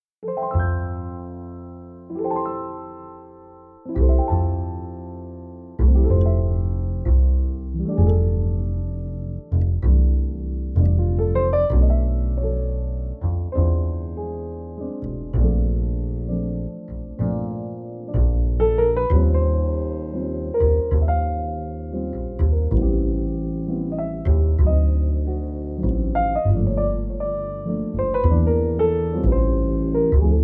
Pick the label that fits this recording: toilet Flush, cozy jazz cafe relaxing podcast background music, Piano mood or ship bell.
cozy jazz cafe relaxing podcast background music